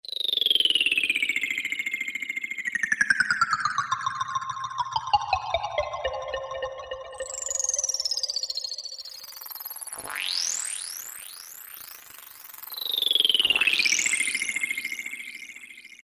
Clicker, Korg T3

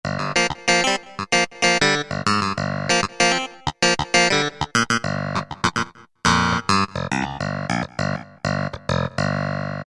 Yamaha TG500 KY clav1

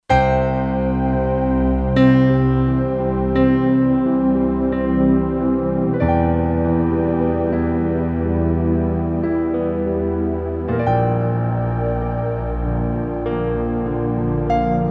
Pno & Syn String, Kurzweil K2500X; Factory Programs

